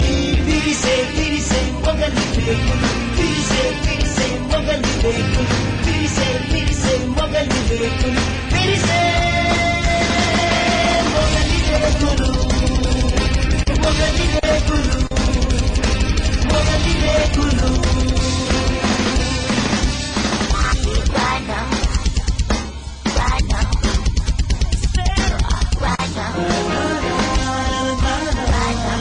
hindi serial ringtones; for mobile phones

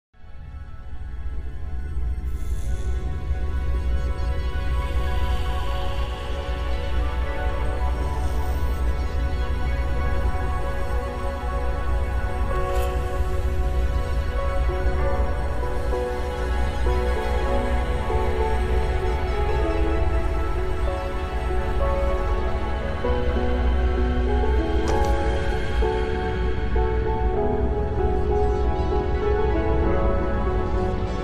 sad bgm ringtone; pushpa

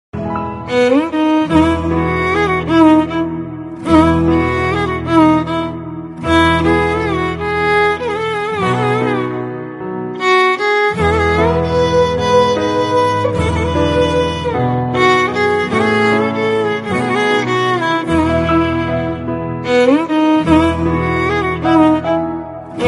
Malayalam Romantic Violin

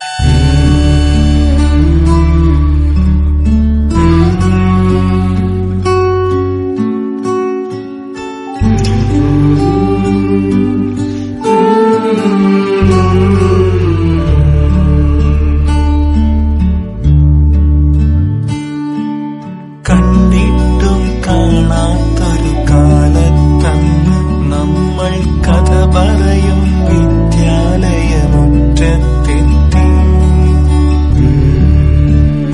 malayalam nostalgic ringtones

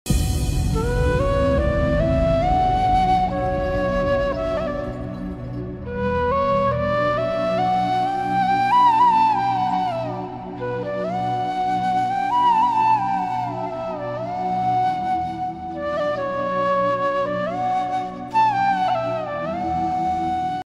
flute ringtone

